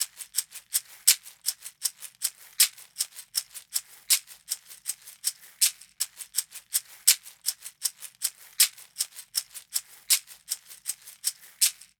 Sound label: percussion shaker loop 80bpm A-2; dolphin